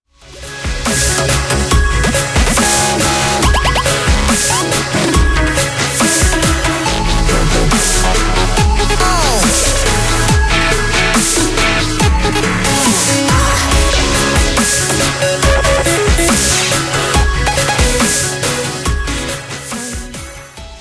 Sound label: BGM xfade; Invaxion